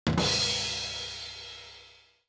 Level-Clear Cymbal; Picross 3D; NDS

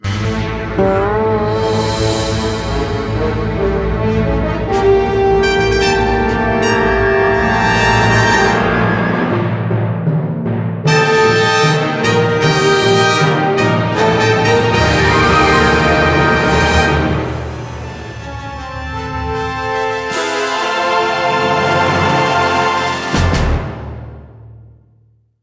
MiniGame Zombies Win; DreadWood

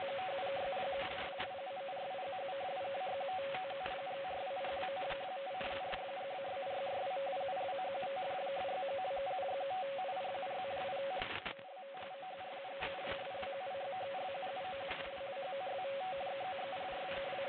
Data Stream Ambience; those nights at rachels gamerip 2015

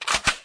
Gun reload; 30 Days to Survive

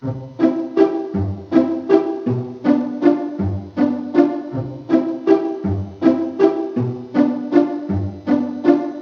music pizzi; de Blob

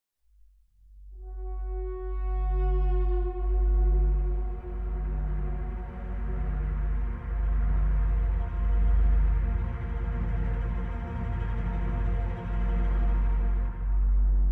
Ominous; sins of a solar empire ii 2024

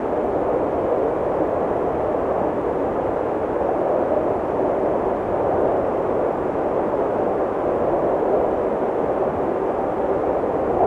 Atmo Soundloops Wind

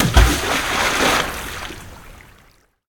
boulder splash

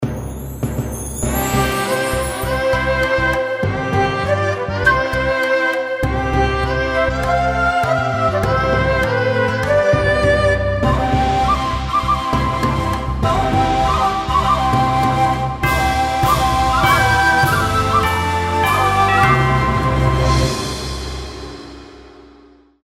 bgm wedding; hero online

